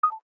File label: Cam Stop; Samsung; Galaxy A5 2014